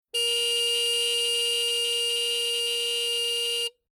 Motorbike Quadbike 400cc Horn Long Single Beep; Transport; Atv Sound Effects

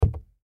Bottle Water Full, Bottle Water Full Set Down; Set Downs; Foley; Blastwave

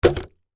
Jug Set Down Full, Water; Foley; Set Down Sound Effects; Objects

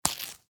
Household Umbrella Unbutton Strap

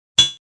Clink, Metallic; Metallic Sound Effects

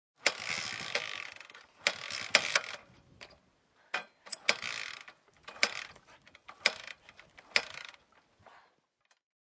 Dirt Bike No Start, Dirt bike won't kick start; Motorcycles

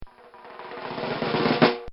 Short Drum Roll; Drums; Musical; Bobby Cole

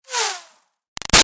Arrow By Zip Thin; Combat; Bows and Arrows; Big Room Sound

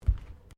Footsteps Bare feet Tiles Step; Footstep Foley; Human

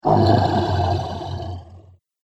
Troll Low Growl, Monster Sound Effects; horror

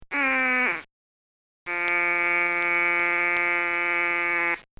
Cartoon Sound Effects Quacks; Cartoon Sound Design

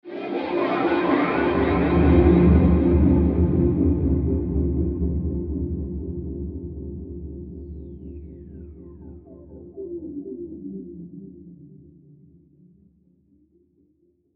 Logo Whoosh Filter Flange Delay Pulse; Sonic Logo

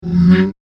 Light Saber, science fiction sound effect; star wars / trek style games, and futuristic radio, tv, and film; Andrew Fleming

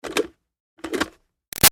Switch Plastic Bubble Machine On-Off Light; Switches; Objects

